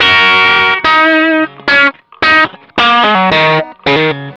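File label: blue riff, Vintage Blues Guitar; USB Soundscan vol.22; AKAI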